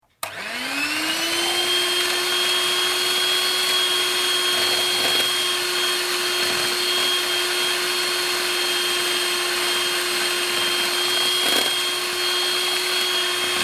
Electric Egg Beater, Small food processor, kitchen processor, start - r; Appliance Sound Effects; start - run - stop